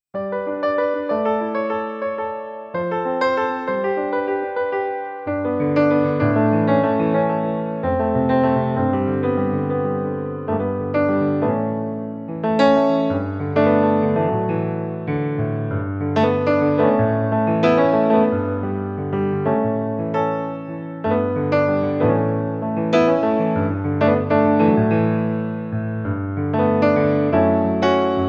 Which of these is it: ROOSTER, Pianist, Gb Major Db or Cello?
Pianist